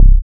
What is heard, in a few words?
808 stab